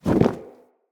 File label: Leather Flap; Ambience; Soul Plane; Vendor